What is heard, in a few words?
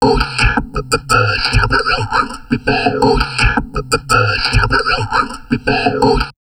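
talk, drum loops crazy processed, 160 bpm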